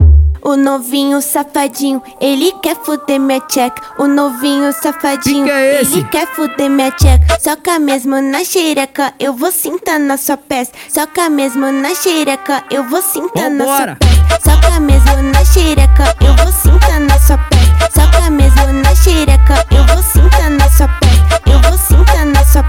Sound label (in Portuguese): funk sertanejo